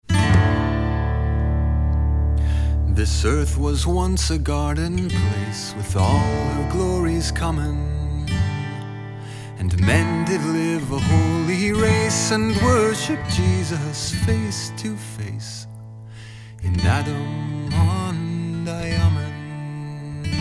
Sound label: guitar; hymns anew; adam ondi ahman